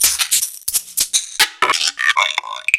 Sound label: Shakers; proteusvx